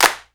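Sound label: claps, drum machine; Hollywood Edge; The Big Bad Beatmachine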